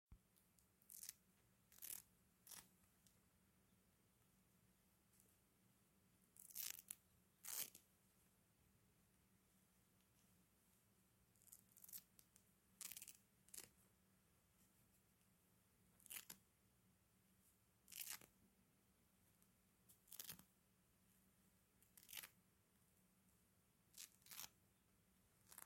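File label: sticking velcro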